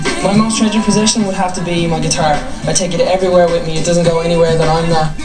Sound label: guitar